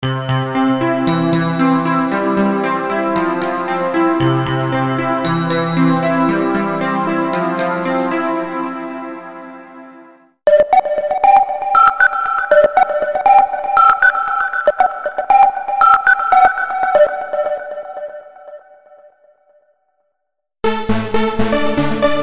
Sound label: Lead